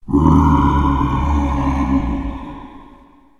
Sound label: mummy; minetest; pyramids; tsm pyramids